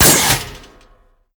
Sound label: Vapor Miner Blast; moul-assets